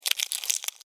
Insect, Eat, Bite, Bug; Animals:Insects; Insects Eating

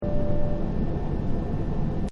Airplane Ambience; Airplanes; Vehicles

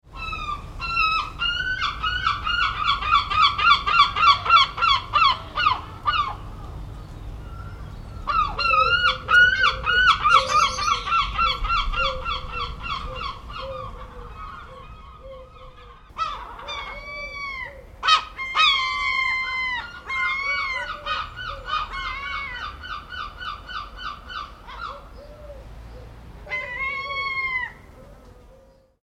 Birds and Seagulls 3 - Seagull cries; Animals

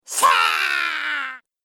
Goblin Evil, monster; horror

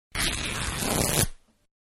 Cloth-Being Torn-Long; Ripping; Manhattan Production Music

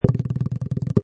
Cartoon Feet, Rapid thumping of fast running feet; Comedy/Cartoon; Funny Sounds; Lynne Music

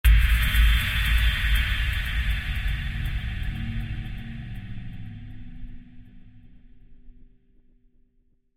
Horror Hit Metallic Delay Deep; Blastwave; Sound Effects:Horror

